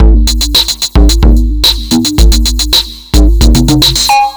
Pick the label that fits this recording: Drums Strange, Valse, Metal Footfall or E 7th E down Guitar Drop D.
Drums Strange